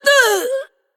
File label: Female Forsaken Death